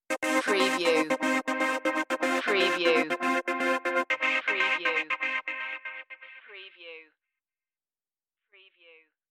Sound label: virus stinger